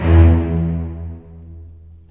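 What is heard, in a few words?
STR, strings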